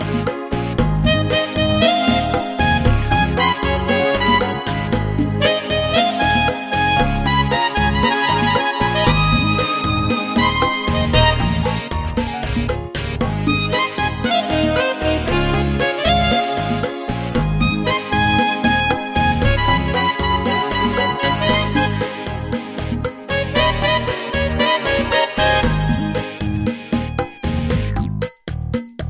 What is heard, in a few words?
Blues